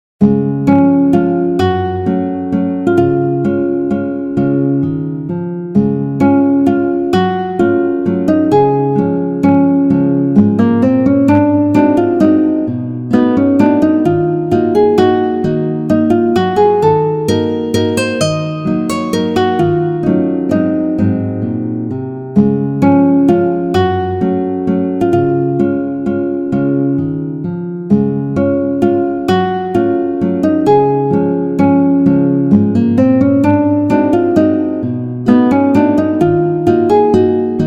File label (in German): Blumenwalzer, guitar; Tschaikowski